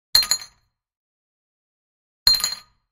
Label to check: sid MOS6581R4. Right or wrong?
Wrong